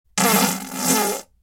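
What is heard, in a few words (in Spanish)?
pedos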